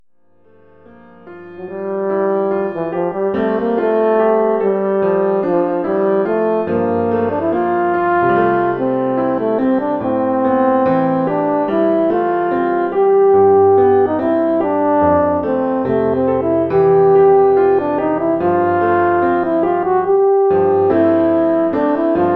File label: french horn solo + PA